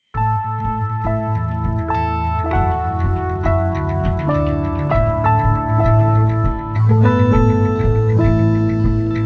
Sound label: Instrumental